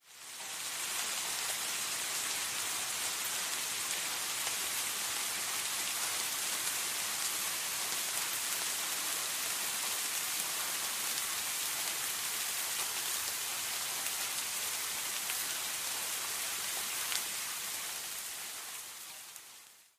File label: light rain